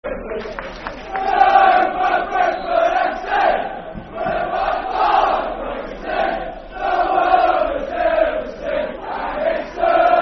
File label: Football Chant (Soccer Song); Super Brentford Fc; Brentford Bfc